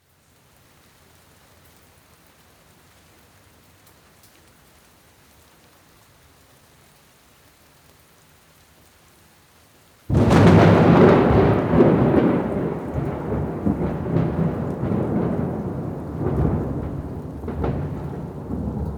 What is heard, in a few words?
Thunder Storm; The Elements